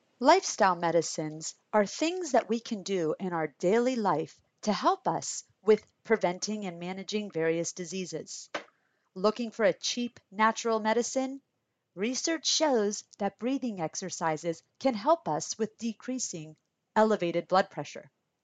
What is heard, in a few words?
Breathing